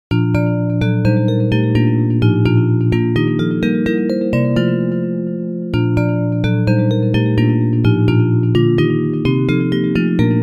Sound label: Bells